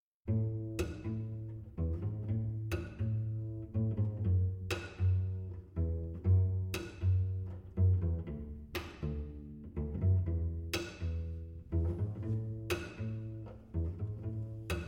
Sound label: String Quartet; Altius Quartet; Pop instrumental